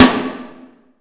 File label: Snare